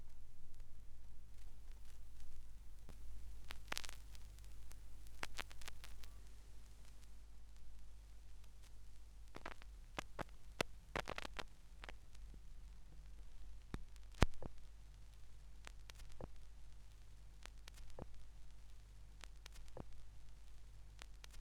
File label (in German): schallplattengeraeusche; rauschpartikel